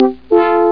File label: fanfa